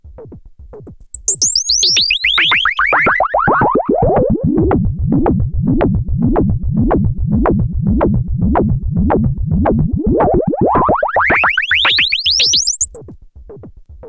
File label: MANIC PULSING, ANALOG LOOPS; Zero-G Ambient 1